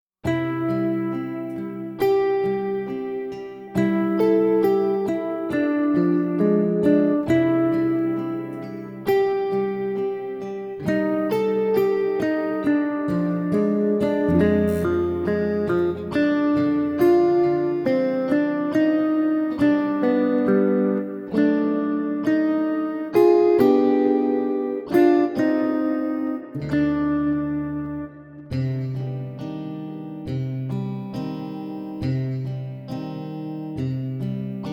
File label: brass minus